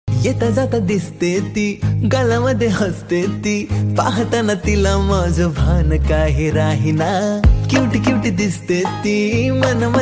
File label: Marathi Ringtone